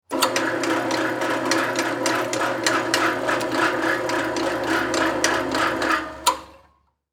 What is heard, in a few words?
Industry Drill Press Operate On Run Off Short; Presses